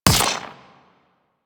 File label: Drone Rifle Fire; Warframe; Amalgam Drone; Weapons